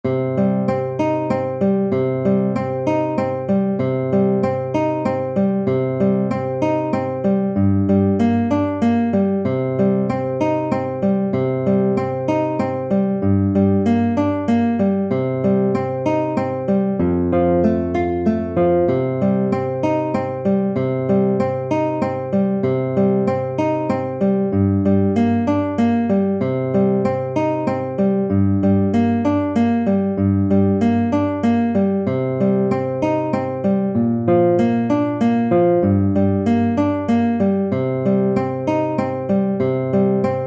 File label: Scarborough Fair in C Dorian no vocal line